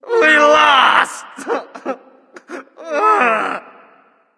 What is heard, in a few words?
boohoo; smite; hebo